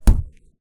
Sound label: orange hit hard; 磕头